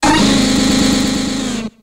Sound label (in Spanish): Grito de Dudunsparce